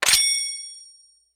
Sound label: Gale Boomerang Lock